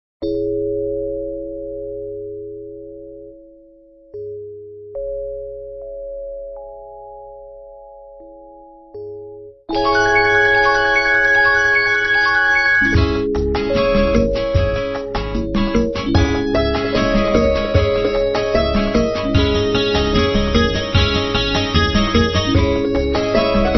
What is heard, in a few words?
Karaoke Tamil